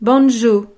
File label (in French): banjo